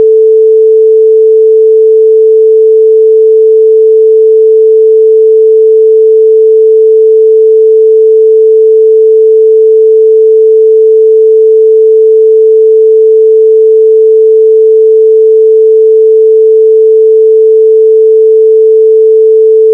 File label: Sine 440